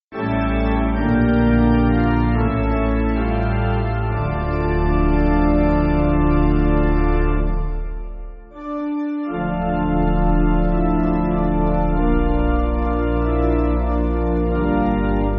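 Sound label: Pipe, Pipe Organ, Backing Files for Churches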